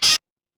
cartoon squeak

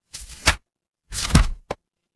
paper fabric page turn

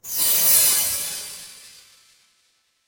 magic scifi spell

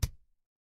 impact metal hit

